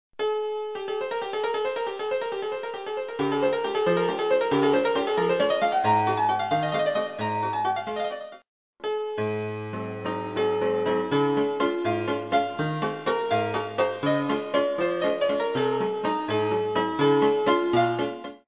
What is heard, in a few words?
melody arabic